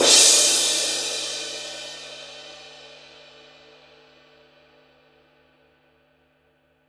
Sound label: rude 20 basher edge, paiste